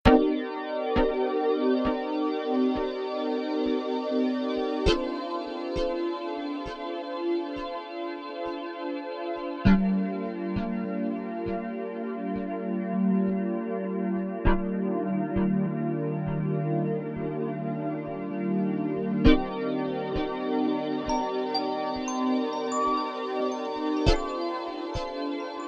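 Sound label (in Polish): magiczna muzyka, 432 hz, magiczną muzyką instrumentaln; magic horizon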